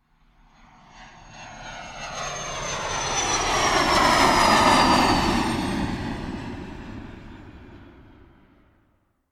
transportation aircraft military jet pass external, Fighter Jet Fly By; Vehicles; Airplanes; McKinneySound